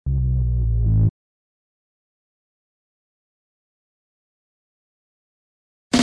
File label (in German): filmmusik